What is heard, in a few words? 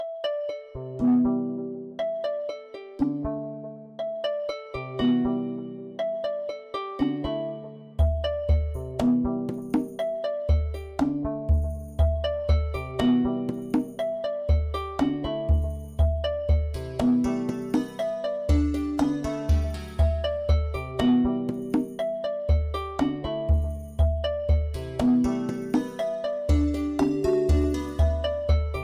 town, music; tundrian biree